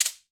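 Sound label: MARACA RIGHT, Latin Hand Perc; E-MU Producer Series Vol. 2; More Studio Essentials; Composer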